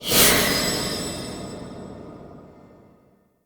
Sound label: Rat Rescue Bite Stinger; Verminia Quest